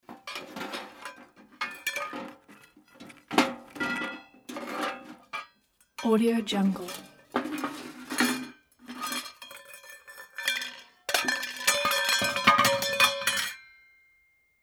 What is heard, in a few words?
scrap metal